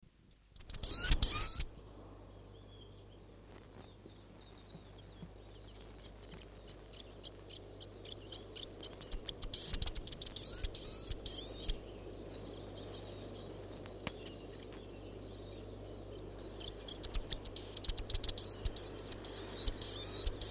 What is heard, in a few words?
chatham island fantail, bird song; native animals